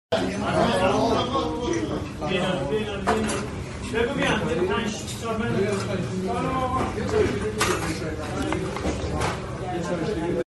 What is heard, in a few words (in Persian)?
صدای قهوه خانه